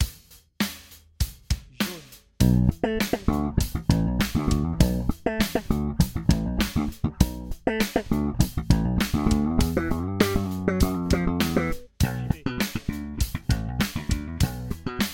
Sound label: slap passif